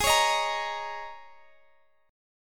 G sharp Suspended 2nd Suspended 4th G sharp, guitar; strummed; x,23,23,20,23,x; G#sus2sus4